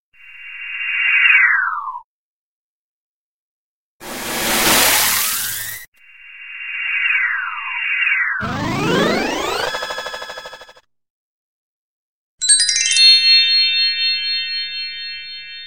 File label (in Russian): Дерево оживает, Гусь